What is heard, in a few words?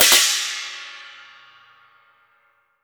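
LARGE CYMBAL, CHINESE PERC; Spectrasonics; Heart of Asia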